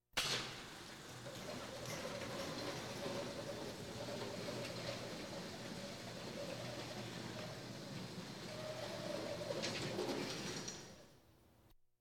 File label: Police, Fire engines